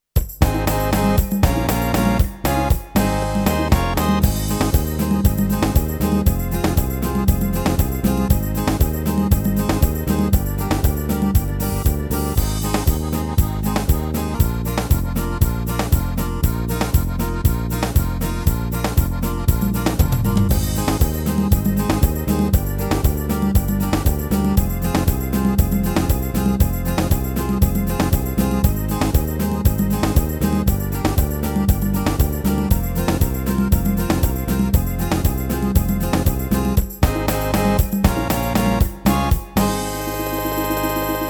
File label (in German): PSR-S670 Rhythmus Sertanejo